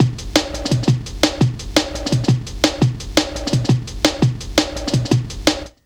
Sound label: LOOP, Jungle